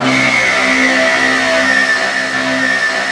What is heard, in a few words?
big disto; East West - Global Sounds